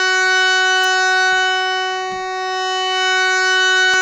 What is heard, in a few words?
HARMONIUM, World Instruments